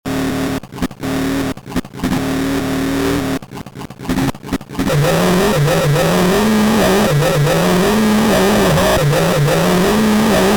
rumble barn buttons

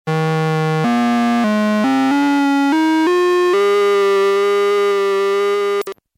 fantasy vibrato

